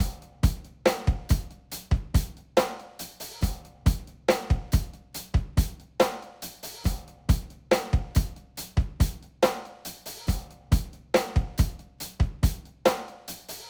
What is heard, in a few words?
rock